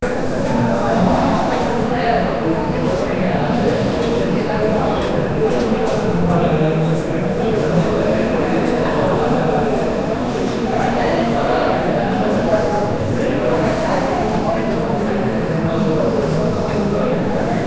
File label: environment sound inside, classroom